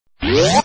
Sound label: Door open